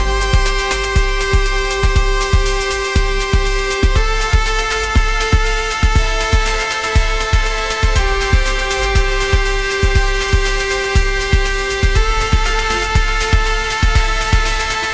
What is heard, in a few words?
upbeat pop melody; ai